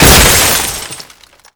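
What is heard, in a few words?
glass break automobile medium; Explosions; Call of Duty Modern Warfare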